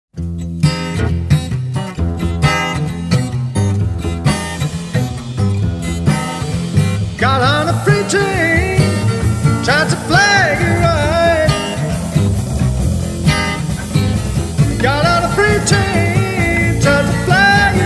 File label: Blues